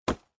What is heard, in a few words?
wood impact